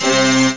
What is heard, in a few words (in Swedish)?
kyrkorg